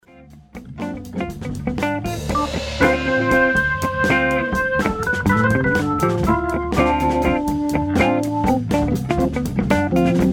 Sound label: organ grinders instrumental trio; demo sampler